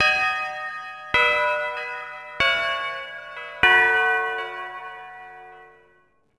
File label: chime; Pure x Cure Re:covery; PS2